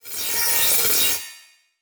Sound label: Title Transition Electrical Power Down; Production Element Title Transition